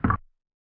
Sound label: quiet pop, drums single hits; Claps